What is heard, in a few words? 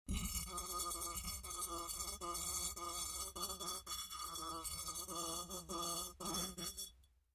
Fly Buzzing Inside Bo, flies